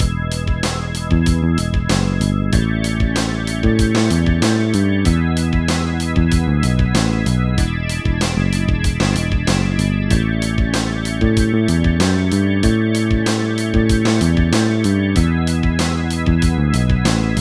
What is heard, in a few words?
Shake For Sound Font